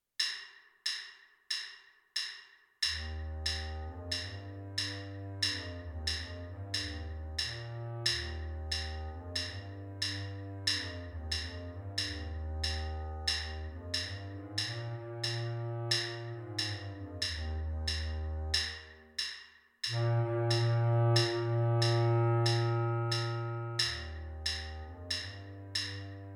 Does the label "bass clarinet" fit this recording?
Yes